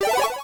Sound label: scored hit, pinball machine; PinballMachineMaker